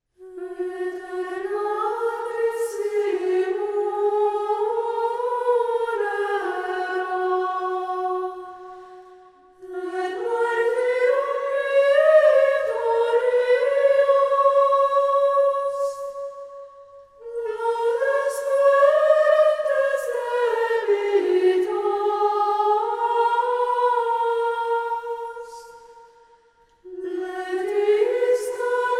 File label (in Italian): Inno Gregoriano; Aeterna Christi Munera